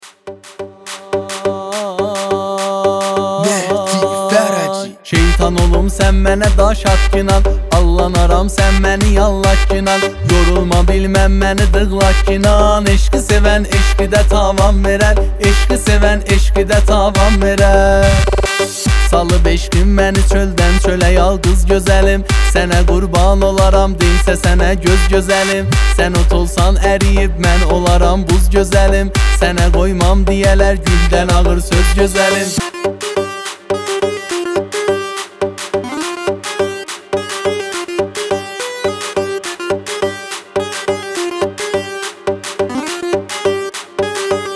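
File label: remix Turkish happy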